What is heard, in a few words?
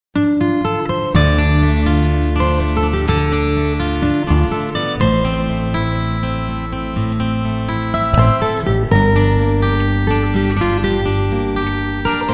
acoustic